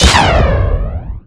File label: crit received; Garry's Mod; tf2